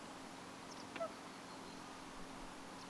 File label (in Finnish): naaraslaulujoutsenen huomioaani kun se suojelee poikasia